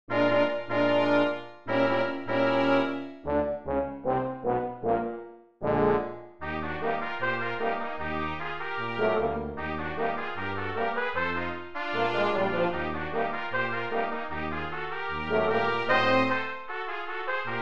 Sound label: easy dixie